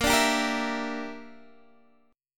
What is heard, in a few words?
Bb 6th Flat 5th Bb, Guitar DADGAD